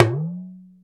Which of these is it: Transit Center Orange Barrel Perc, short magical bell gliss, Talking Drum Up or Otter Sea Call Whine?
Talking Drum Up